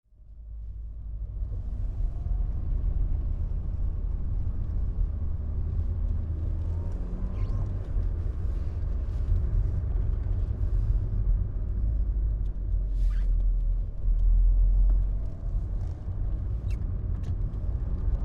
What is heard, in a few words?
Saloon Car Interior Travel, Driving onto motorway; traveling at speed; Car Sound Effects